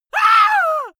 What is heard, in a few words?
scream hysteric male; vocals; comedy-cartoon; soundpool